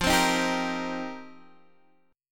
F 7th Suspended 2nd Suspended 4th, Guitar Open G

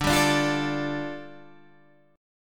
A 7th Suspended 4th Sharp 5th, D

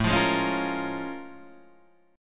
Bb Minor 7th A; Bbm7/A strummed

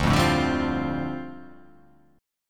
D Minor 11th D down, guitar

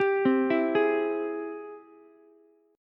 Ab Augmented Major 7th Ab Ukulele; arpeggiated; JGuitar

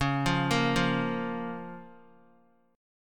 C sharp 6th, Guitar; arpeggiated; 4F,4A,5C,5G,5C,6E; x,4,5,3,5,x; JGuitar

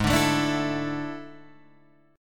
D Suspended 2nd Ab; strummed

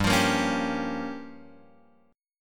G Minor 7th Flat 5th, Guitar Open F; Gm7b5 strummed; JGuitar

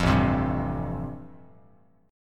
A-Minor 7th Sharp 5th, Bass Standard, chord; Am7#5 strummed; JGuitar

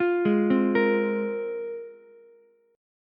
G sharp 6th Add 9th G sharp Ukulele C6; arpeggiated; JGuitar

